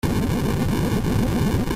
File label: explosion; ff3us